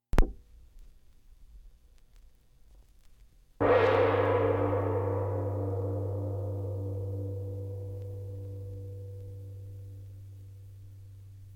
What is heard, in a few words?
Gong One Stroke; Jac Holzman; Authentic Sound Effects Volume 2